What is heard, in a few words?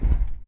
footstep jog; Titanfall 2